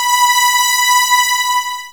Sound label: PAD Dance; Best Service Gigapack 1